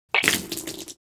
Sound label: Man spitting spit water